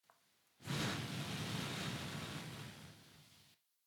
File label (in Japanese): 耳舐め音声 (LR逆ver); 古き城のドラゴニート2